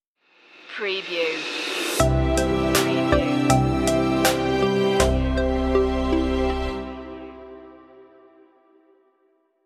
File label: stinger, relaxed and chilled out track; electronica; chillout